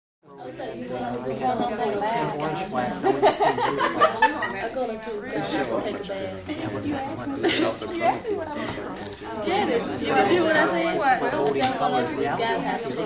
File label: American Mixed Happy Chatter